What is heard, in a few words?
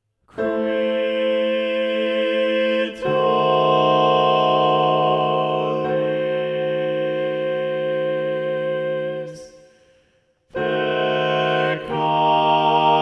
Balanced Voices; Qui tollis; Gloria; Vivaldi